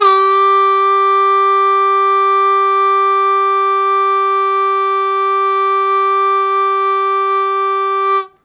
Loud Attack Sustain G1, Harmonica; Zero G - Harmonica

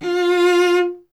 STR VC F 0, Cello; Miroslav Vitous; Solo Instruments VOL-1

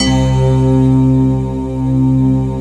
D50 Stak, Roland; 90s Sample CDs; Club-50 - Foundations Roland; D50 Syns

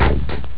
hard bass; Amiga Soundtracker sample

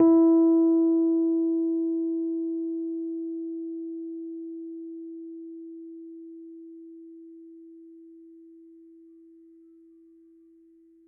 E4, Rhodes Mark II; mkII 88 key LO; CEPCo Sample Series Vol. 3

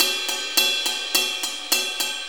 4-4 Ride Loop; 4-4 Drum Loops; HiHat Ride Loops; Drum Tools

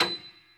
pno B6, Piano Grand; AKAI S-1000; Retro Sampler; Piano Grand PF St

